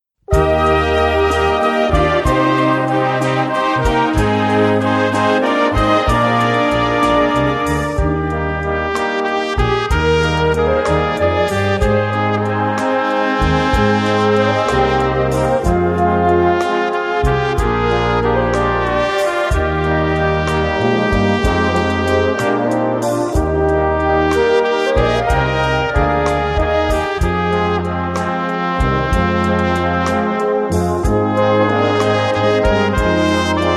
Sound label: Trumpet